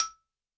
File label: BALAFON